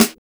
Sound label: SMALL SNARE, drums